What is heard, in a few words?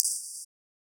Click Lock Fail; FREE SFX PACK VOL 2